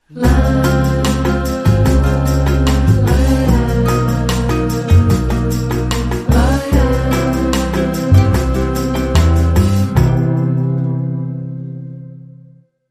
Dreamy Bossa, Sting

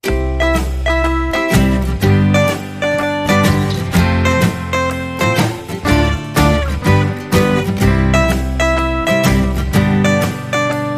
Sneaky Kangaroo Jazz

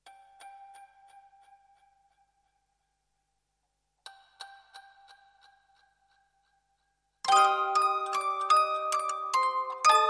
Music Box

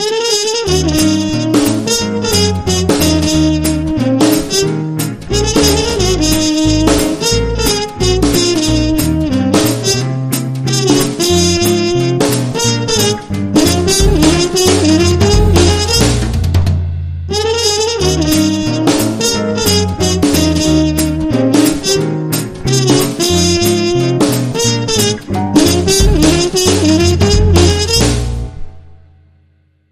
Muted Trumpet Swing

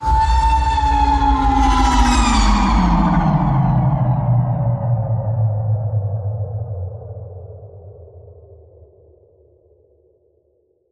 Cosmic Gravity Waves

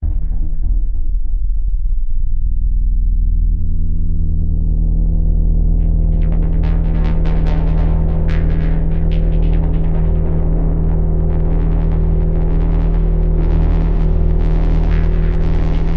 Low

